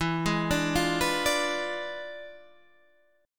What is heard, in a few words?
E 7th Flat 9th Guitar Standard, chord; E7b9; arpeggiated